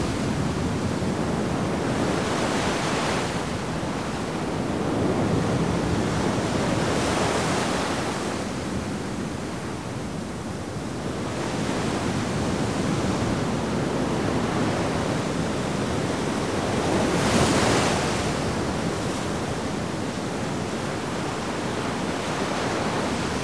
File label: sea looped